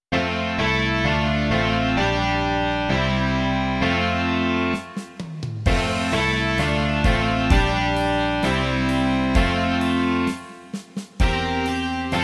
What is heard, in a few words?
rock